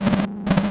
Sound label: Science Fiction Sci-Fi Electronic Distortion